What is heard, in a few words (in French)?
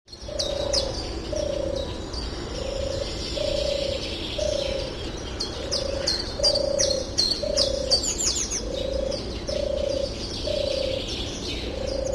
Tourterelle des bois